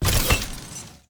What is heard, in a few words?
button; svencoop